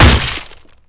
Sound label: glass; Rust